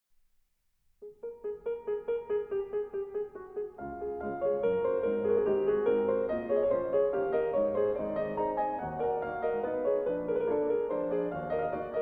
Three Studies No. 3 D flat major; Methode des Methodes

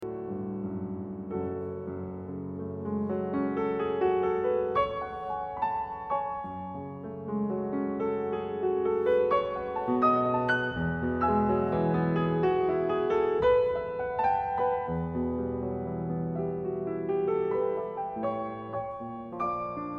Prelude In C Sharp Minor; Op.45

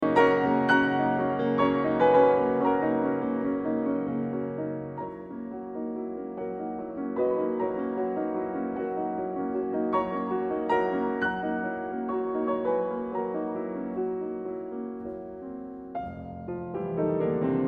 Preludes Op.28 No.21 B Flat Major

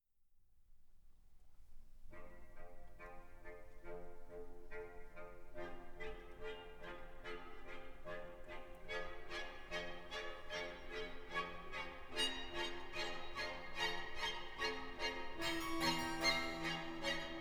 Allegro non molto; Sinfonia Varsovia

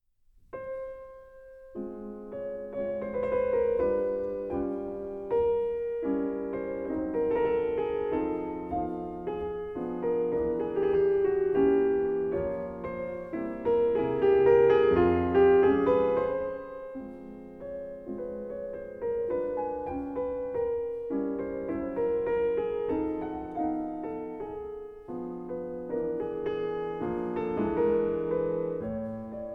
Mazurka

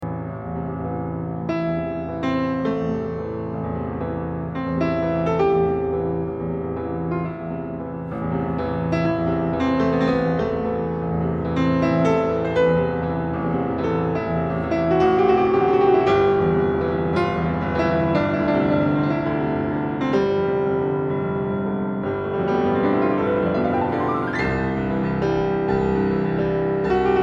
Preludes Op.28 No. 24 D Minor

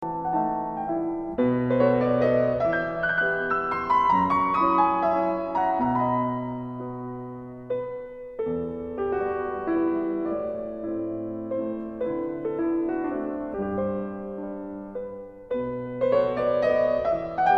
Mazurka in E Minor op. 17 no. 2

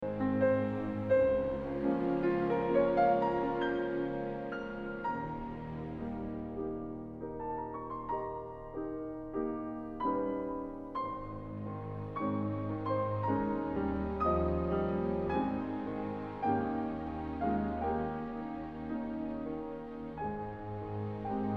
Larghetto

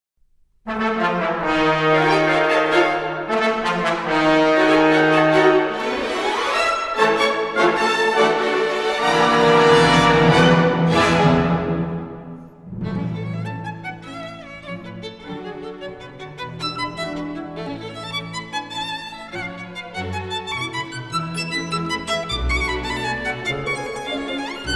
Violin Concerto in A major Finale Vivace assai; Op.8

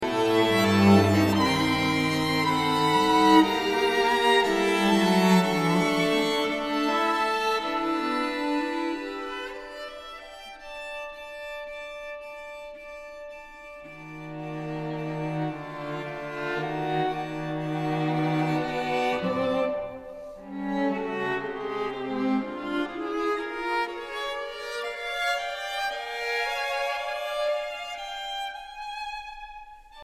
String Quartet; Bacewicz; String Quartet No.4

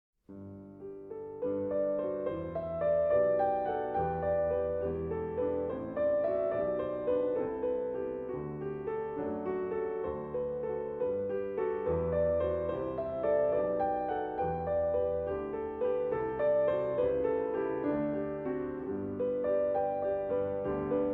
sacred piano

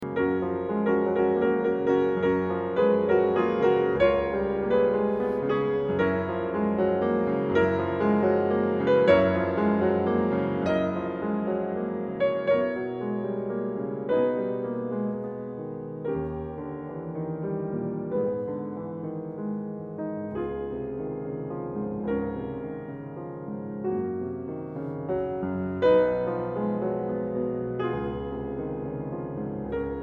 Preludes F Sharp Major; Op.28 No.13

